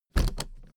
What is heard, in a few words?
suitcase put down soft